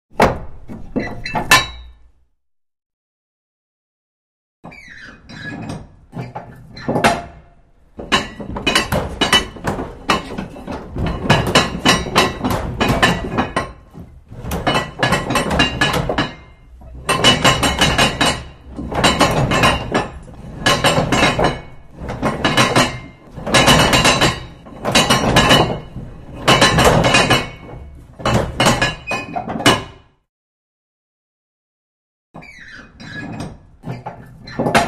Portcullis; Passage Vertical Gate With Large Ratchet Mechanism, Up And Down; Metal Gate